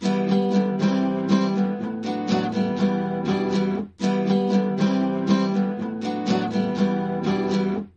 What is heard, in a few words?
romantic